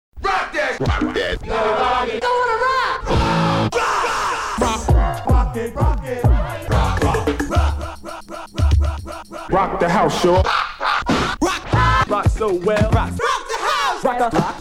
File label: accapella